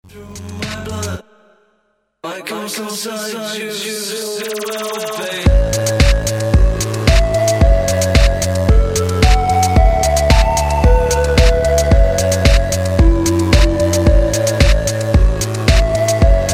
slowed reverb

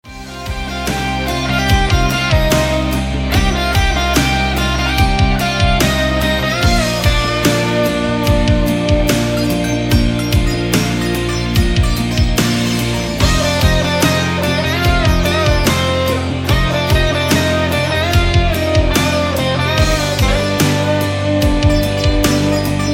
instrumental

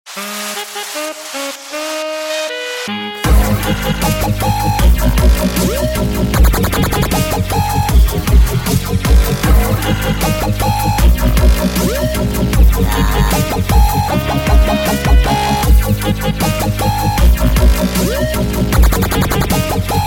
dubstep remix

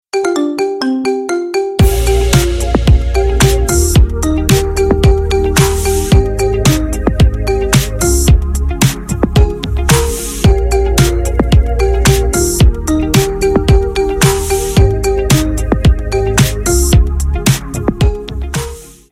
marimba remix

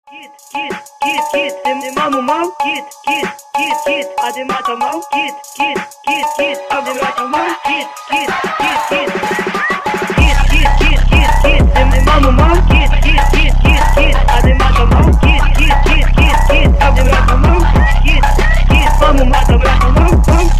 phonk remix

